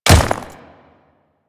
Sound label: Ice Step, Footsteps; Warframe; Grineer Royal Guard